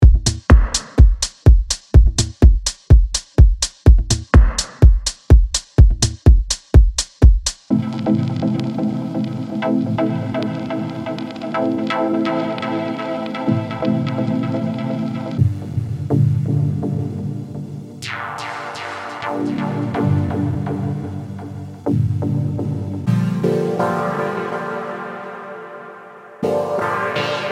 dub techno chords

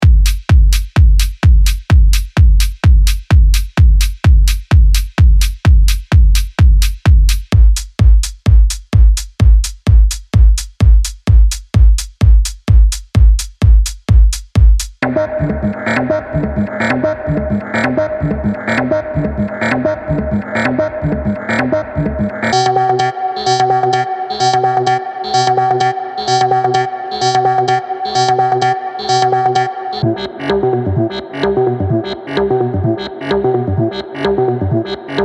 techno

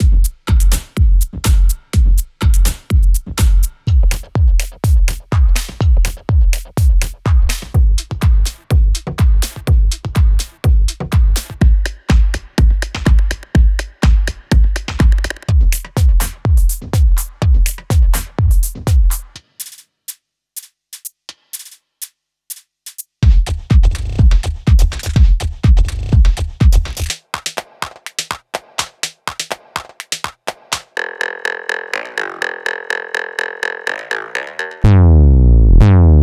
minimal techno

